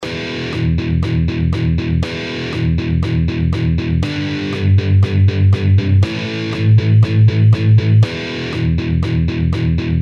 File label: Rhythm guitar exercises